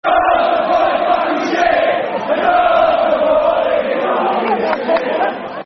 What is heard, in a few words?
Football Chants (Soccer Song); Your Support; Tottenham Hotspur Thfc